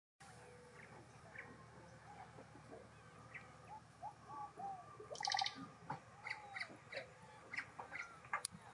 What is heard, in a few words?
jungle